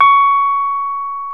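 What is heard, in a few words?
piano Rhodes Mk.V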